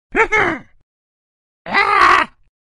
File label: Bird Sound Ringtone